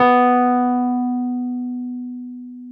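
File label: Wurlitzer electric piano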